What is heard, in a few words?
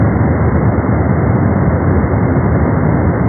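roar of an airplane engine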